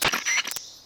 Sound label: radio; mgs